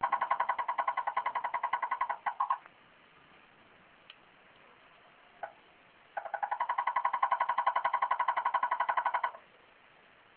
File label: frog